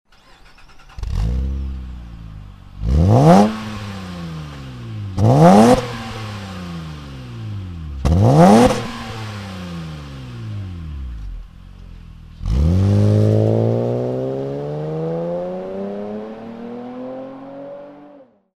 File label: magnaflow exhaust systems